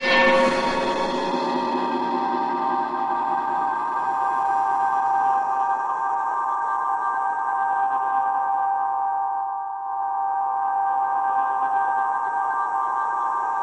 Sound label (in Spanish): zumbido